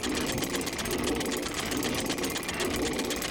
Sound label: Golf Windmill Loop